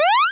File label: jump; raylib; floppy